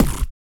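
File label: vox percussion, Vocal Planet Jazz FX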